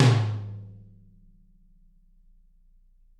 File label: TT, Toms; Bob Clearmountain Drums I